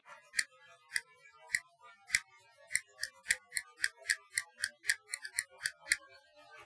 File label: Castanets Violin Applause